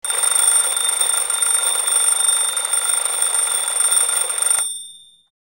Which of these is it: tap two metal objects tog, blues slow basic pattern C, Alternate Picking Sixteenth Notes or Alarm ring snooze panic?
Alarm ring snooze panic